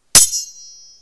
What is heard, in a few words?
sword clash; RPG Creation Kit; RPG Sounds Pack 4